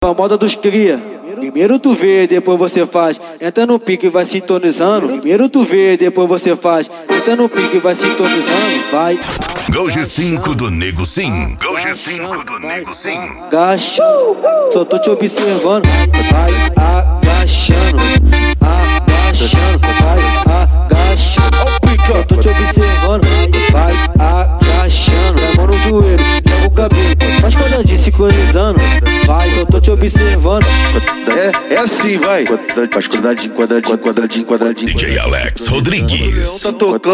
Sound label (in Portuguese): funk mega bass e noia dance